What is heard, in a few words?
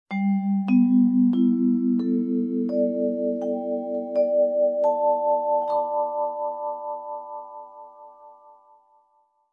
Resolving ascending vibraphone melody